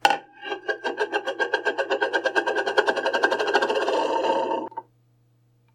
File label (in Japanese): karannkaranran